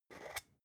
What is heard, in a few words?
spice jar scratch tonal open slow; industrie wirtschaft; gastronomie